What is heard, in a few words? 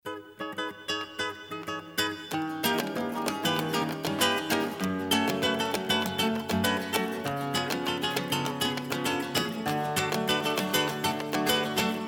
Pedal Sound; Super Sizzle Effect